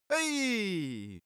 cartoon male jump

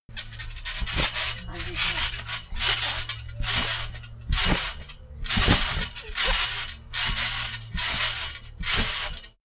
trampoline

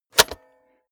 cassette player slide tray insert medium

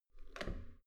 metal door heavy handle up soft

